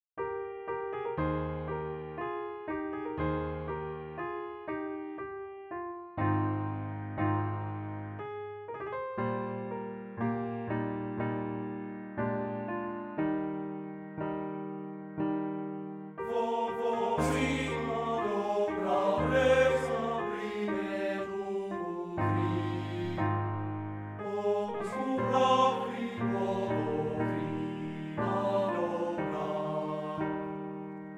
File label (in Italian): An die Nachttigall Lied per voce e pianoforte; Rheinek